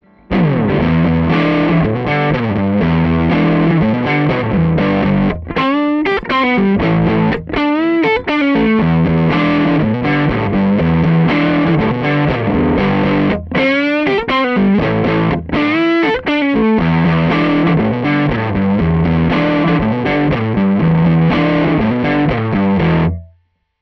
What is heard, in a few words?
riff; machinemodeling; TONEX